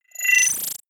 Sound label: data transfer beam